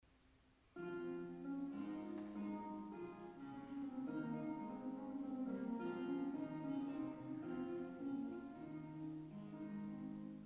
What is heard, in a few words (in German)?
Gitarre und Gesang